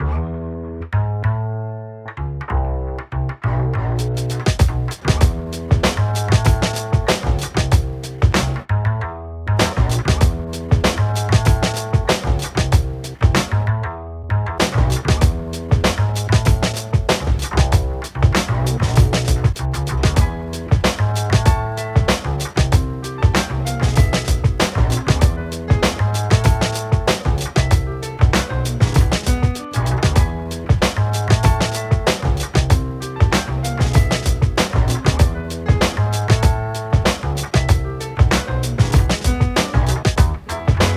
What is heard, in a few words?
disobedient beat